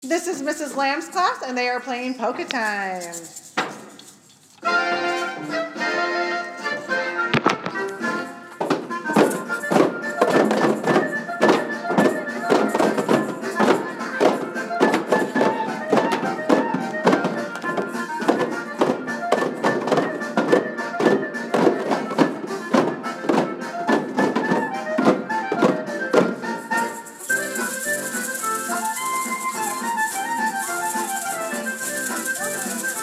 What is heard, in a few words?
polka